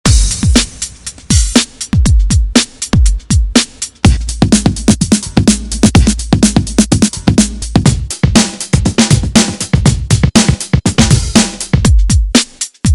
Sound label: Breakbeat loops